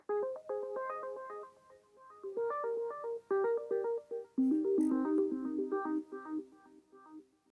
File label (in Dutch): Muziekfragment Hedendaags